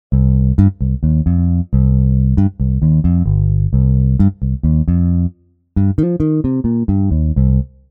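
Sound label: Electric Bass; TURBO VL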